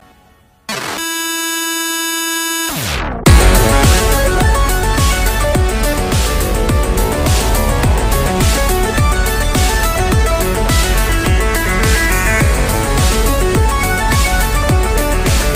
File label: Game Over